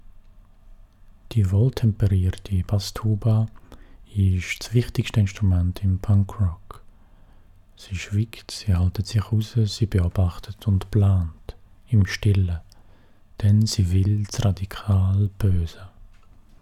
Bass tuba Voice

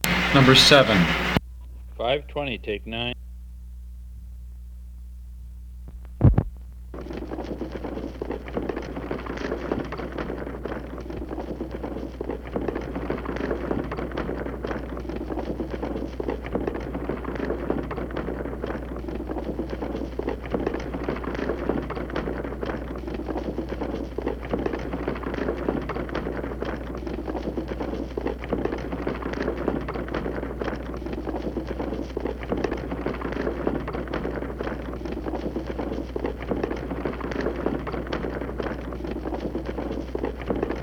Horse Drawn Carriage

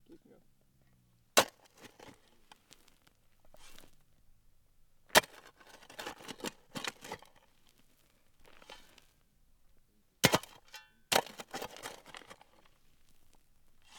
Shovel Shovel Digging Into Dry Dirt

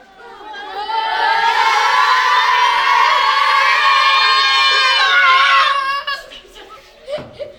Children Cheering Inside School

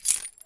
Keys

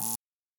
Decline Buzz Beep

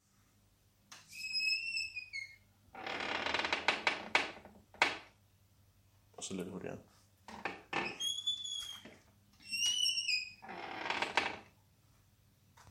Door Squeaking

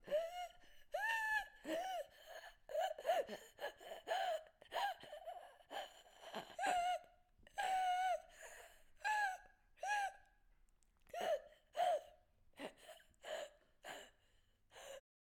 Person Suffocating

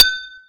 High Agogo

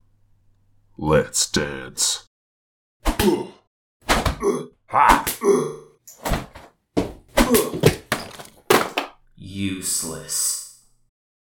Fight Soundscape

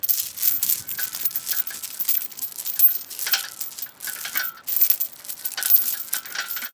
Chain Rustling

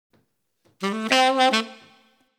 Short But Sweet 8beat Dmaj 110

